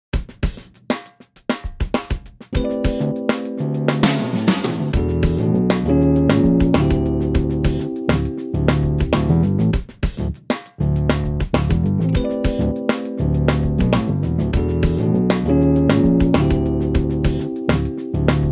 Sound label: Funk and Jazz Love in Em